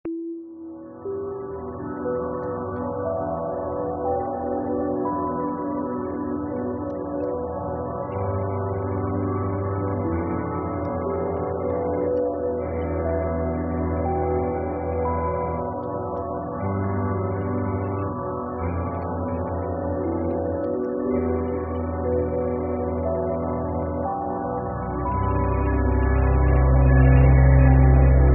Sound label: music for trailers mysterious